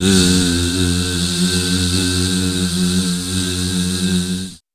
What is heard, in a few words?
WEIRDOS, Voice Spectral; Best Service; Voice Spectral II